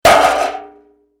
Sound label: Impact Metal Bang; Materials